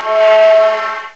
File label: FLUTE, WIND